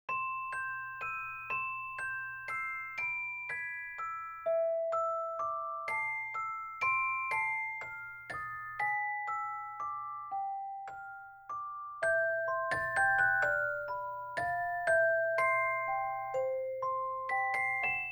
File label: Bubbles Celeste